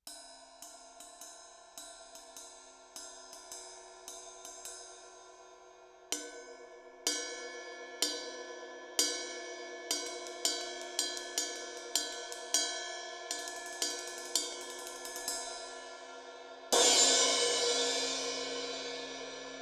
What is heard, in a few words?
Cymb Ride